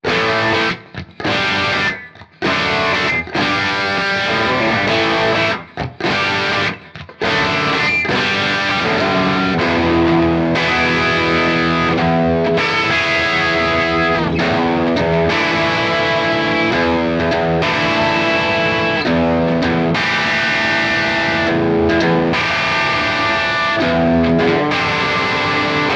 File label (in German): Gitarren Plug ins im Vergleich Guitar Rig 7 Crunch Rhythm